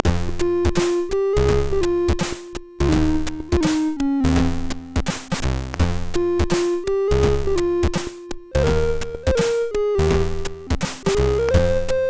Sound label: Danny Boy sid MOS6581R3